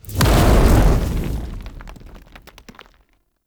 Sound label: Ashen Spirit Fire Blade Explosion; Enemy; Abilities